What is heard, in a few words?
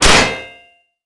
damage; nicole